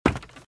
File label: Footsteps - Wood - Creaky - Run - Right